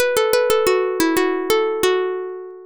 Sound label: plucked